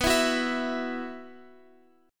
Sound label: B Suspended 4th, Guitar, Open F; Bsus4; strummed; x,x,11,11,9,11